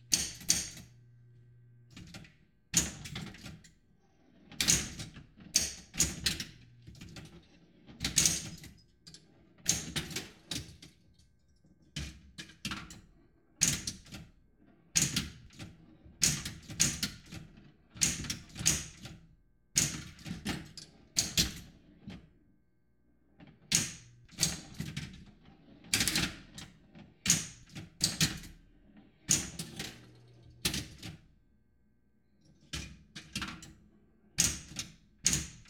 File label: Pinball Play